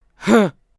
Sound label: Grunt